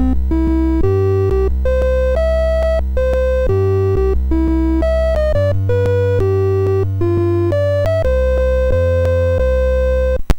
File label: sid MOS6581R3; Ludo BASIC